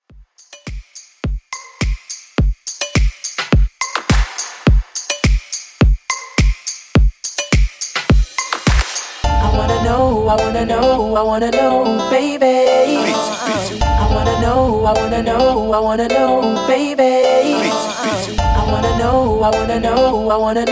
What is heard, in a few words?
105Bpm; Redrum